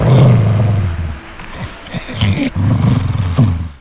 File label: grrr